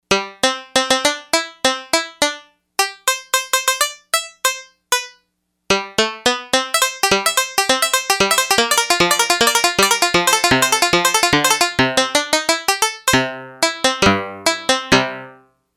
Old Banjo, Yamaha DX100; Factory Patches

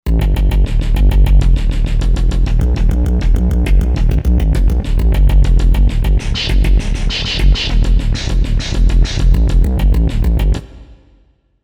Generator, synth

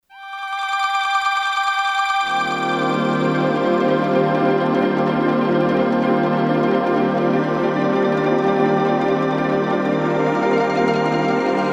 TREMPAD, Korg DSS-1

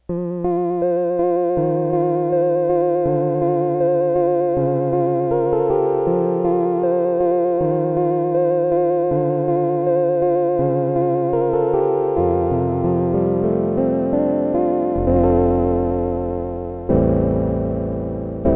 Low Piano with vibrato, Multivox MX-20

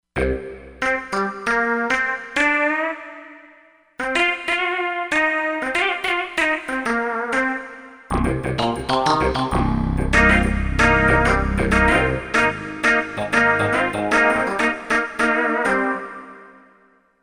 Funky Fish, Korg MSC-07S Synth; Korg MSC-07S Synth 2

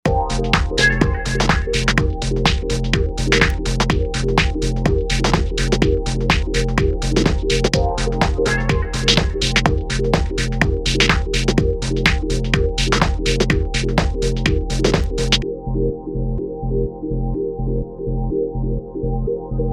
Full Hous

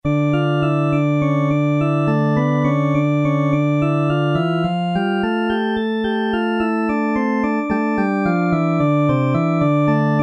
full organ, Technics SX-K450

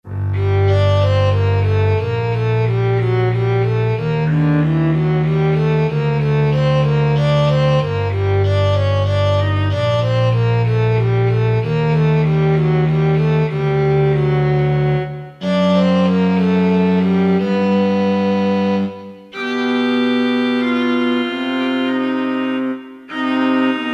Bass & VL, Korg DSS-1; Woodwind & Strng

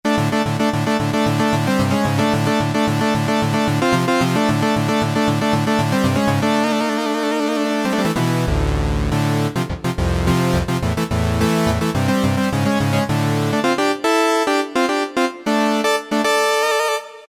JUNO TRANCER; Akai SG01v

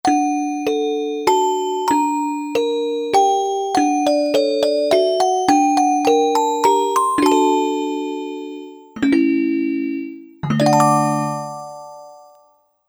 Celeste

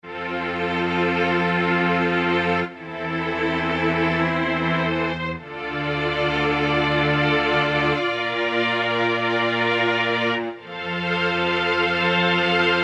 Mellowtron Str, Roland JD-990; Factory Patches

